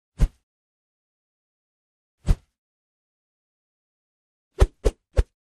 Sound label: Swish Heavy 3x